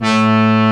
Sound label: OMNIVERB, ARP Synths; E-MU Formula 4000 Series Vol. 3; Analog Odyssey; ARP Synths II